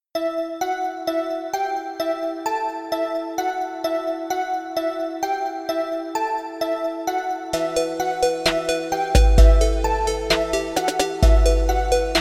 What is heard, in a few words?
Trap Em